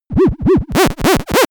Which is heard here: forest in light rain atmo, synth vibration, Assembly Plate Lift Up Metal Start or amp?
synth vibration